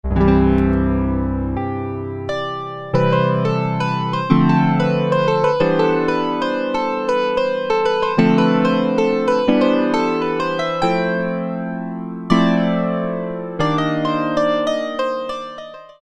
Piano, Roland MKS-50; Factory examples

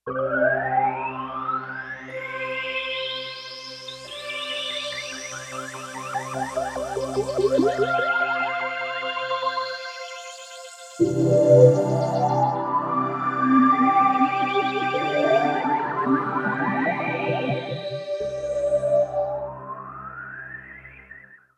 RainForest; Digital Synthesizer

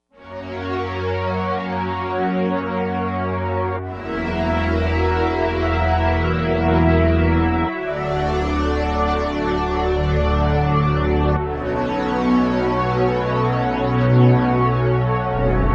Lush Detune; Digital Synthesizer

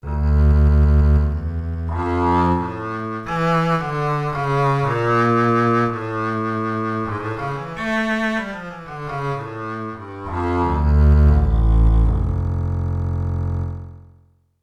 Contra Bass

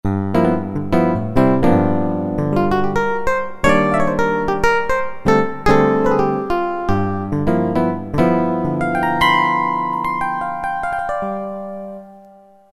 Acou Piano, Roland MT-32

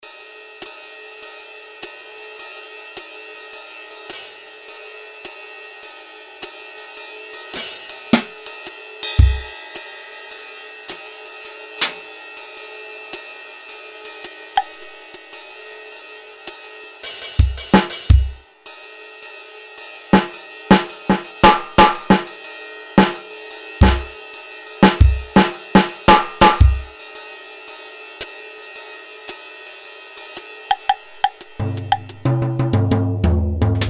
Jazz Drums, E-mu Pro-Cussion; Factory ROM kits

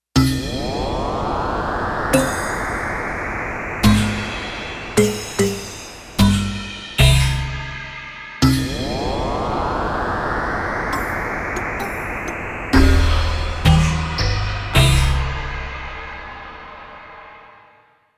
FX Menu

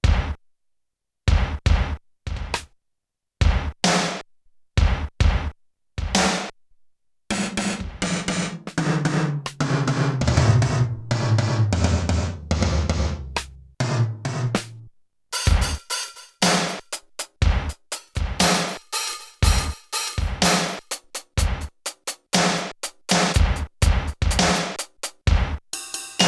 Mono-Mix, Drum Kit

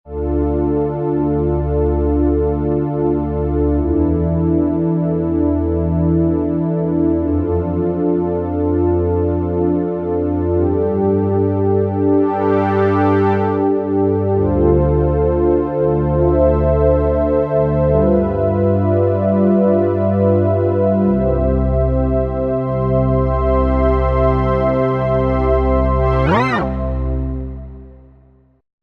JP-8 Soft Pad, Roland V-Synth; Factory Patches

